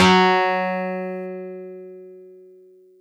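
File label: Easy Clav DX100 F#3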